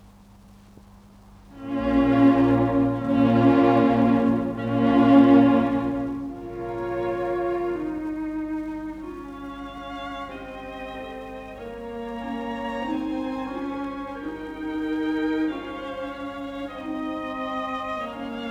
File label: Concerto Grosso in C minor, Andante allegro; Handel; Op.6, No.8